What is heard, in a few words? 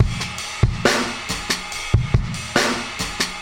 Drum loops Boombap